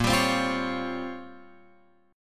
A sharp Minor 6th Add 9th A sharp down Guitar Open F, chord; strummed